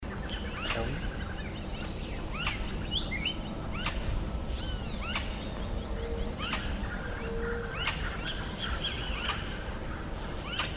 Northern Screamer Chauna chavaria; Oswaldo Cortes

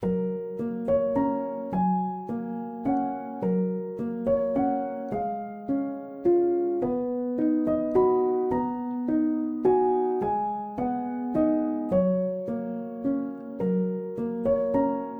Rock-A-Bye Baby

